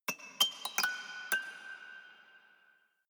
haunted strings tinkle sequence slow short

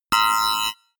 sci fi alarm red flash short

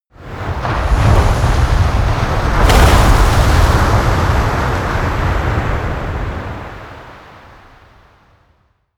coast oneshot surf strong

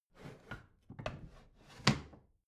bathroom drawer close rattle

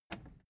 plastic button scratch release disable

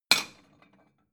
plate small shelve hard

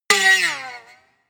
spring

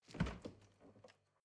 refrigerator door open hard

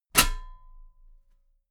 metal ladder step heavy

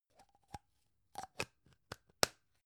gum plastic box close long

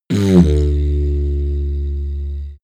didgeridoo short heavy

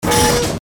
hydraulic blast

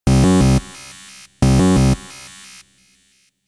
notification warning

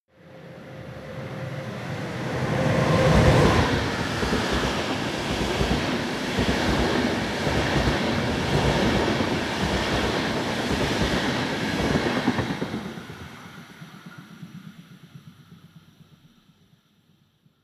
train passenger drive by

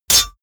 sword hit metal

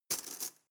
cutlery teaspoon drawer grab hard

